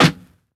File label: Snare Crackle, drums; One Shots; Basket